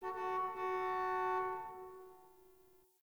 Parking Lot Distant Car Horn; Warframe; Ambience; Nineteen Ninety Nine

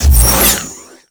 Sword Whoosh; Warframe; Cephalon Weave; Weave Boss

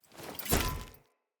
Sphere Polearm Ground Finisher

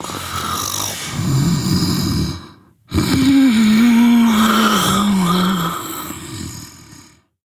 Snores, Mother, Vocalizations; Warframe; Deimos

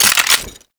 Pump Shotgun Reload Grab; Weapons; Warframe; Zariman

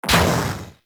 Grenade Explosion; Warframe; Caliber Chicks Minigame; Bomber

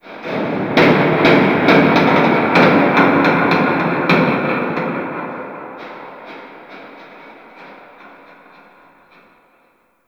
Shipyards distant metal groan, Ambience; Warframe; Grineer Shipyards

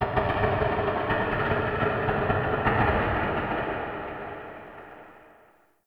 Wooden Clicks; Warframe; Shadowgrapher

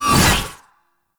Whip Swipe; melee; weapons; Warframe; Dagath Blade And Whip

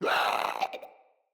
Infested Runner Hear Sound

